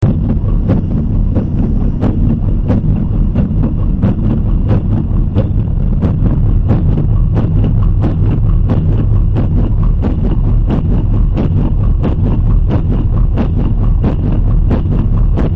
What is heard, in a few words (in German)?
Ventile tief